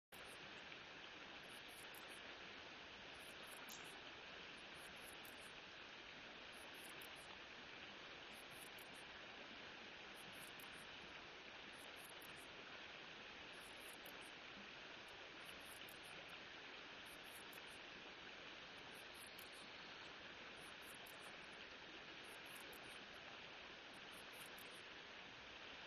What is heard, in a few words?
riverside